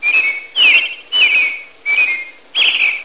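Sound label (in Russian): ликующее громкое щебетание малиновки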